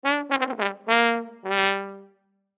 Trombone Descend Melody; Brass; Blastwave

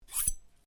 Blade Swish, Sword; Foley sounds for melee weapons; Swords; Combat; SoundEffects-Foundation1

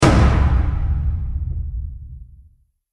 Explosion Impact Solid, bomb; Bomb Sound Effects

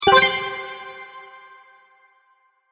Sparkle Collect Win, video game sound effects

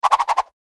Martial Arts Ninja Star Throw Whip Spin Twirl; Warfare; Blastwave

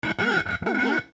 Finger Squeak, Finger Squeak On Ceramic Plate; Squeaks

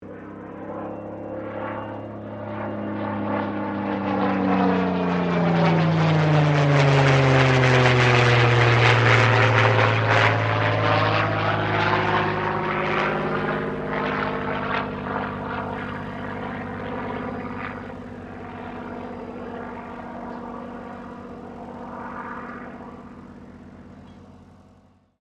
AIRCRAFT SUPERMARINE SPITFIRE MK-XVI PASS; Airplane; Airplanes; Vehicles

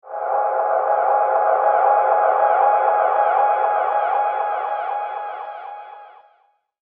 Ambient Horror Effect, scary soundscape; Andrew Fleming

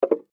Container Small Set Down On Table; Foley; Set Downs; Objects

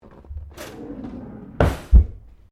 Drawer Large Kitchen Close; Various domestic drawers; Household

